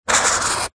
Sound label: office marker pen draw line on tissue paper